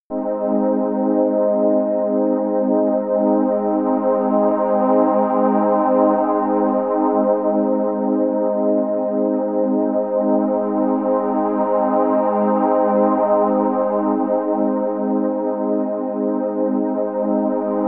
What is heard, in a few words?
relaxing energy, music elements